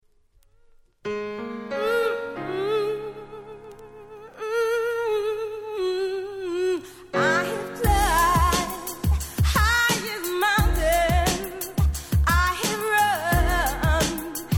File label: chimes still